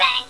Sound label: bang; valve; elf